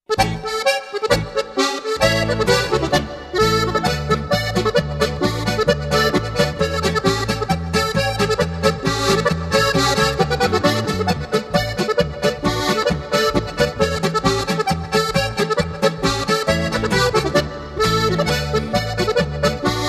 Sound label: harmonika polka